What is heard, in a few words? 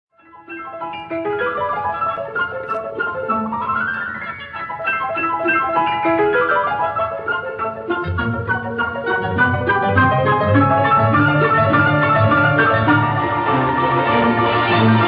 Piano Concerto in A major Allegro animato; Liszt